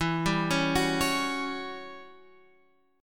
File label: E Minor 6th Add 9th chord